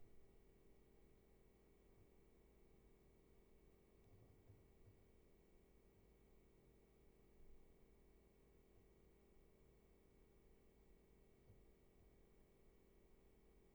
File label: roomtone, Grandvaux